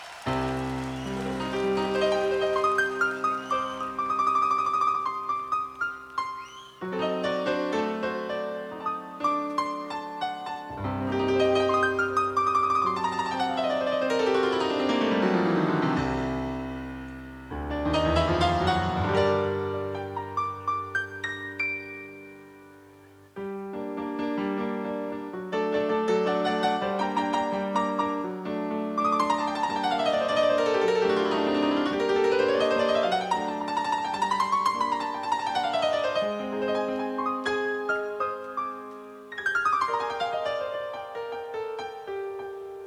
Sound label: Keyboard solo